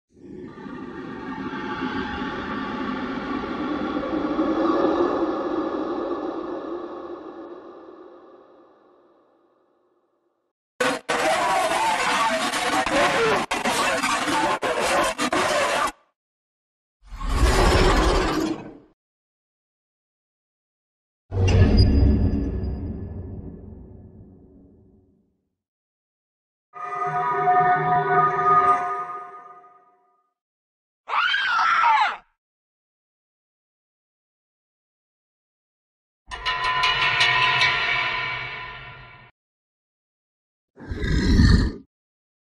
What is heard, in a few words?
suoni horror scary sound suoni spaventosi bhoot sound suoni paurosi halloween